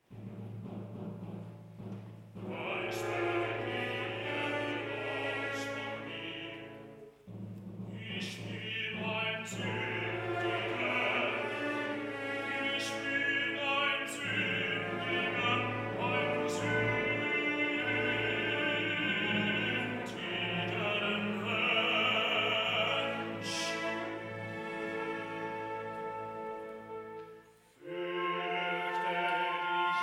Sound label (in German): Rezitativ; I Der Fischzug; Simnon Jesus